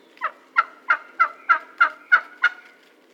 wild turkey female call

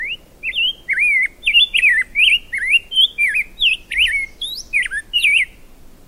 rose breasted grosbeak

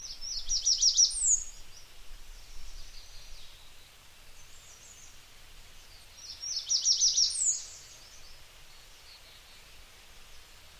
american redstart

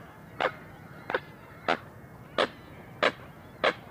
american coot

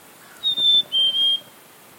Black capped Chickadee

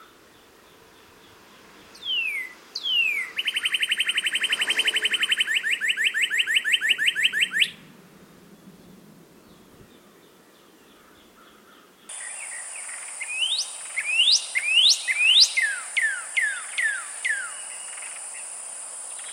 northern cardinal

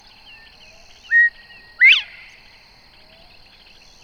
northern bobwhite

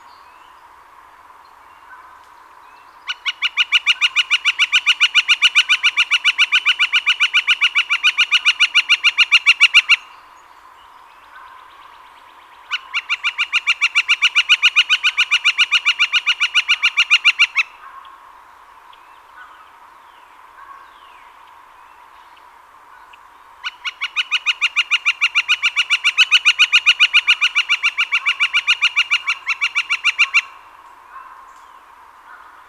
northern flicker

